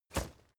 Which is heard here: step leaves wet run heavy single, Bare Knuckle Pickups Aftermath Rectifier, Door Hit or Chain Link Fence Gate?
step leaves wet run heavy single